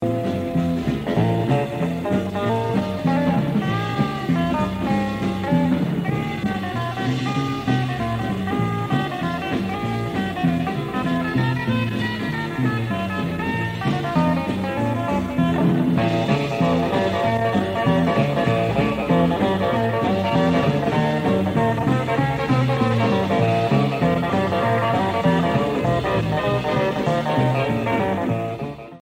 guitar boggie ringtone

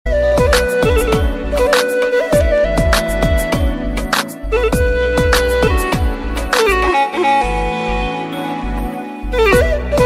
mountain flute, ringtone

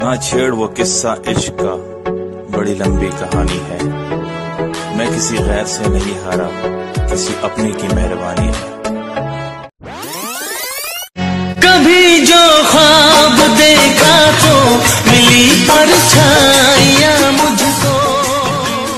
romantic ringtone; bollywood ringtones

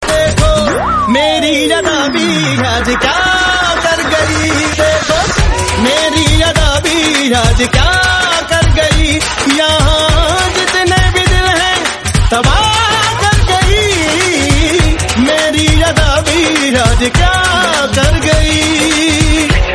bollywood song tone ringtone

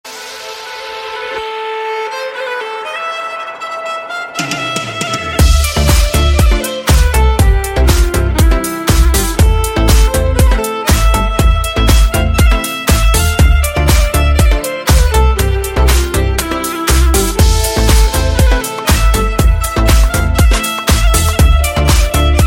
samsung s9 violin ringtone

